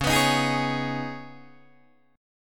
C sharp 7th Suspended 2nd, C sharp, down, Guitar, DADGAD